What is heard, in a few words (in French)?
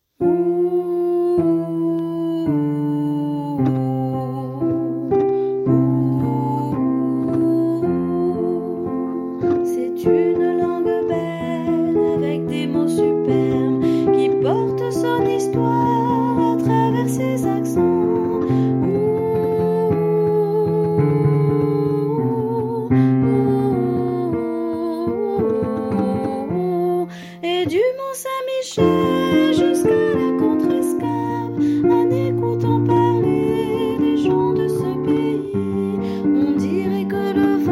Soprano